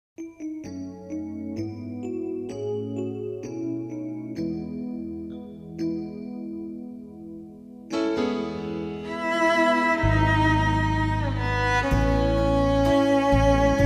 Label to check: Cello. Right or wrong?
Right